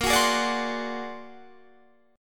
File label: A sharp Augmented 9th A sharp Guitar Half Step Down